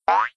jump

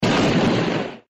crash

